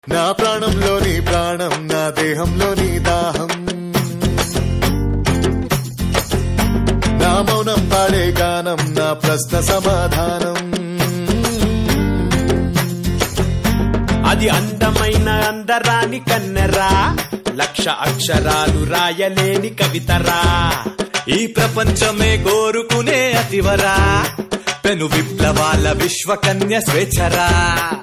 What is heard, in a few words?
Ringtone